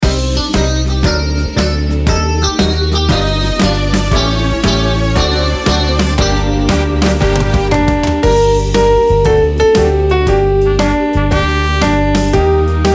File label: karaoke